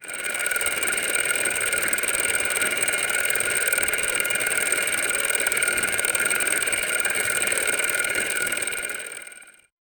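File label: soft watery bubbling gentle; biological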